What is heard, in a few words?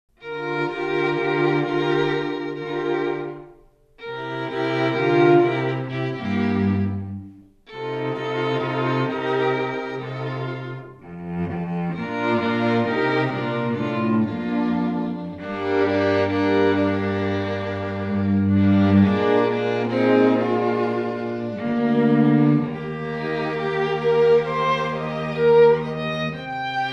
string quartet; Seattle